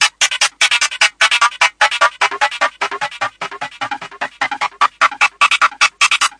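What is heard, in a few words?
climbing echoplex, 150 bpm; SYNTH AND ELECTRONIC LOOPS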